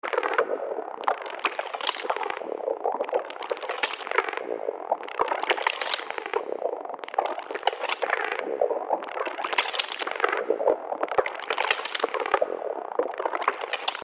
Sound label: wet squishy